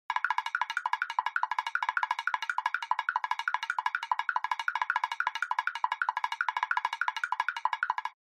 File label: Motion Tremble Long